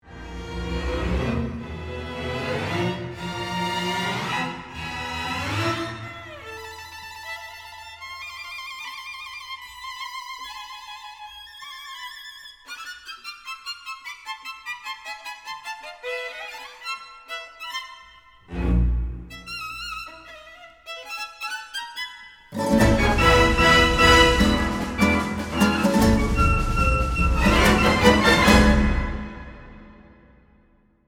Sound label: Violin Duet Adventure Theme